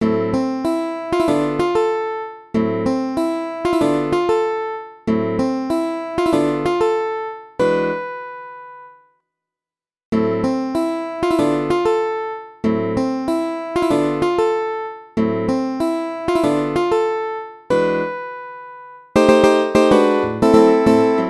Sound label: melody